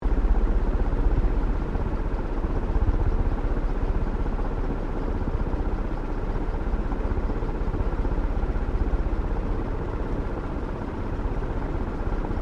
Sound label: FAN CEILING FAST BELOW DISTANT LOOP; Various fans; Fans (Sound Effects:Electronics)